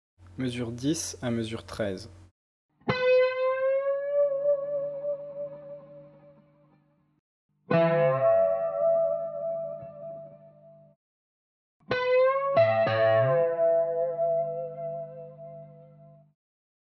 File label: bend serie